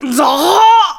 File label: Bloat; Oren bloating; zelda